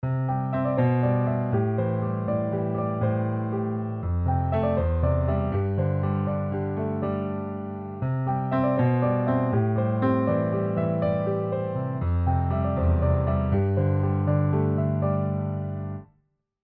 EZkeys 2 Acoustic Pop

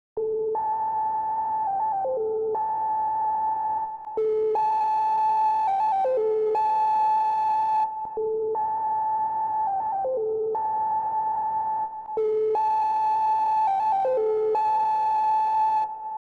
ARP2600M VCF comparison 4012 vs 4072

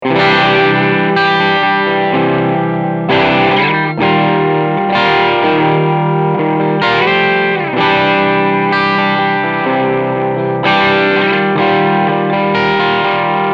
Full Gain

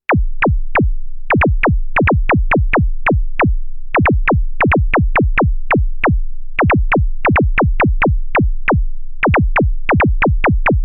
Moog Subharmonicon Patch Laser Kicks

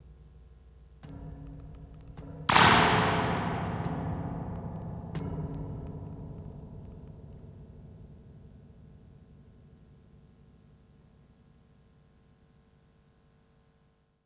Echo Fix EF X2 Mode 2 Spring Bounce

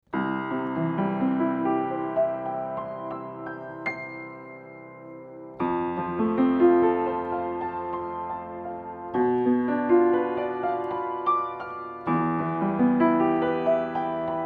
Piano MS Mid Signal

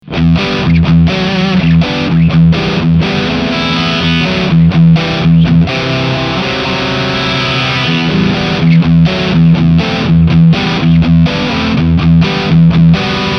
Joyo GEM BOX III Preset British Rhythm

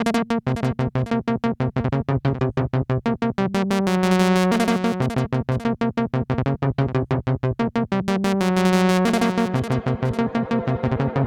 Spring; Golden Reverberator

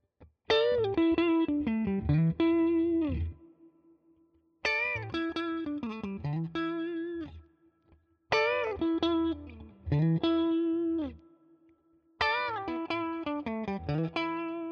Ibanez AZ SDB clean Lick all positions

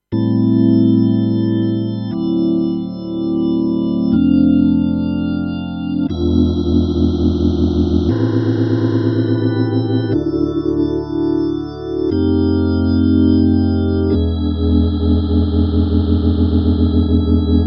E Organ; EHX Lester K

